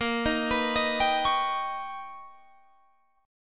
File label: B Augmented Major 9th A sharp, chord